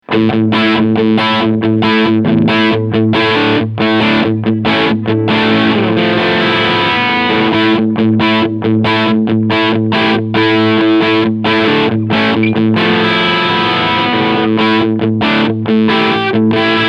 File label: MXR Dookie Drive Blend Right Scoop ON